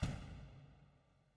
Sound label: door slam; angband